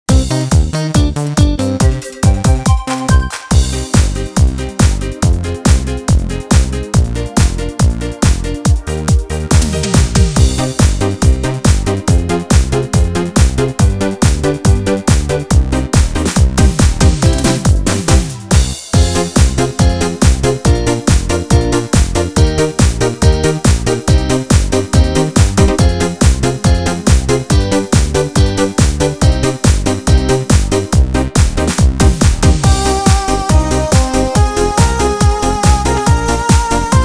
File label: Disco